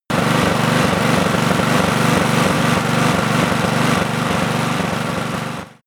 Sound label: engine run steady